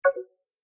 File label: Electricity fold close; Nokia Ringtones; Audio themes